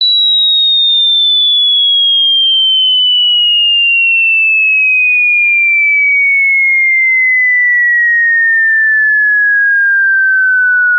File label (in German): cutoff smooth selbstoszillation, moog multimode filter; universal audio